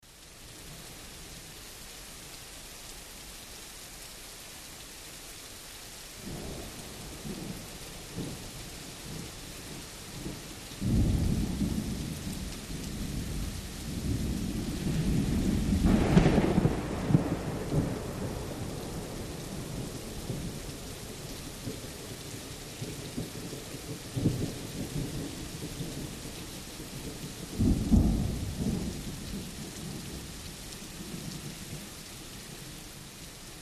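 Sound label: Med Thunder Increasing, distant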